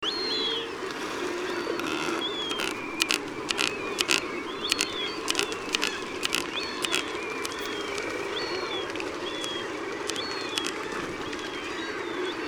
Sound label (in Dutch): Kuifaalscholver